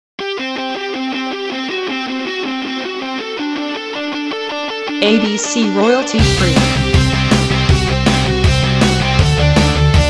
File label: 80s Guitar Instrumental